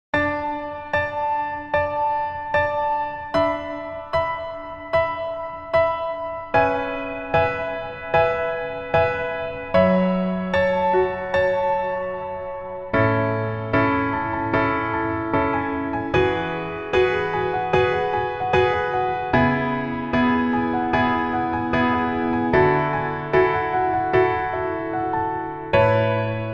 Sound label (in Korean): Piano 멜로디MR 여자키